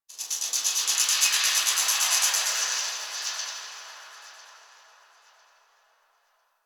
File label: Exterior Space Rings Details, Ambience; Warframe; Rail Jack